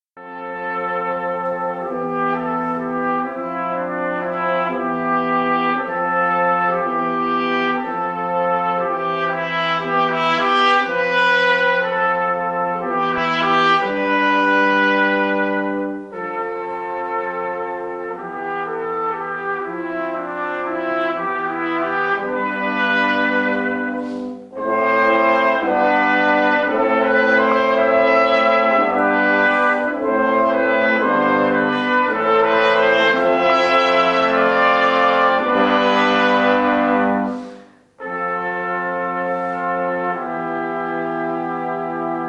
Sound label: Grungers for Brass Offertory